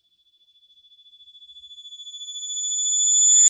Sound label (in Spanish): telefono oficina